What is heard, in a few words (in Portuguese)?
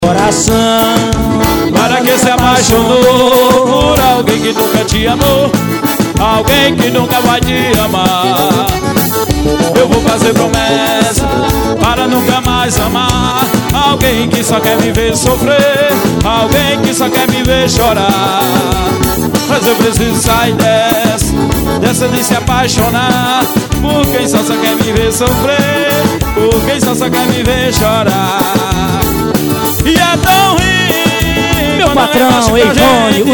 forro sound